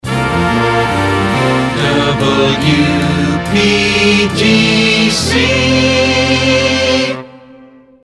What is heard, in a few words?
jingles resings fades in; pams